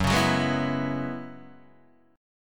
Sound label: Gb 7th Suspended 2nd Suspended 4th, Guitar, Open D